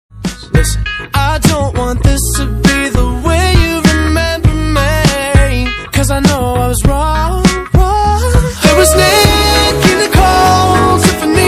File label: english ringtone